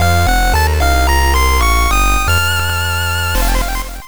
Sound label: Found Item; Shantae; GB